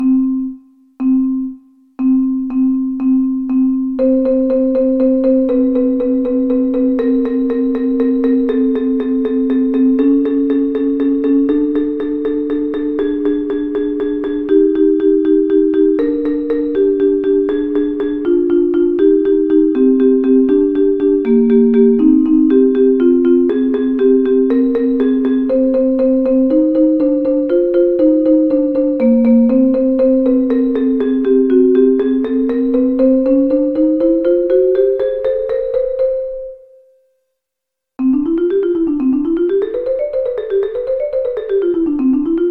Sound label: front ensemble fast, drum music; marching; Warm-Ups